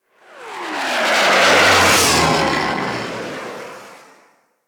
Grineer Fighter Ship B Close Fly By, Space Fighter Ship Engine; Warframe; Railjack; Enemy Ships